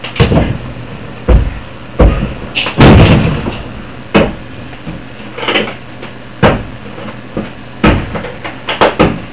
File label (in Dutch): brekend slopend